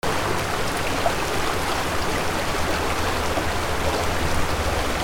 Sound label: WATER